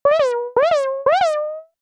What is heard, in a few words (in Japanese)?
kawaii